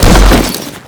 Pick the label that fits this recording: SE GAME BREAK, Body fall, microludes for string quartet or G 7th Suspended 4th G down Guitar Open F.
Body fall